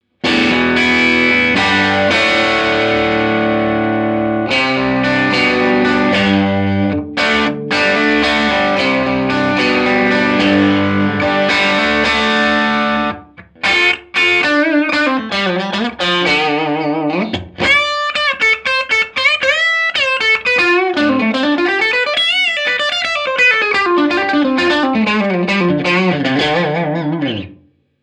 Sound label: overwound crunch bridge; schwarzbach